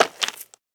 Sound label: stone footstep